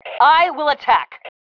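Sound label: attack, female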